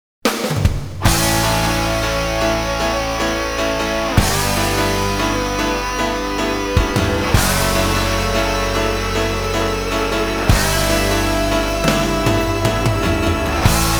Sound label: Staff Roll; S-Wind; Garou Densetsu Battle Archive Volume 02